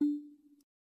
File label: hit